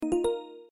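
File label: chat message received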